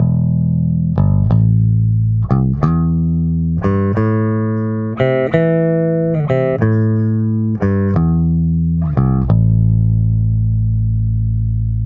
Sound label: TRBX flat